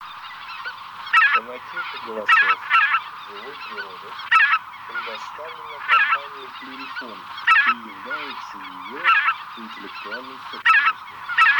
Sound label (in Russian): Белолобый Oca Lombardella; plurifon